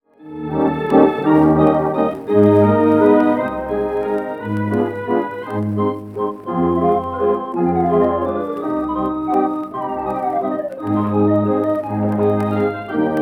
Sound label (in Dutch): A'dam spierement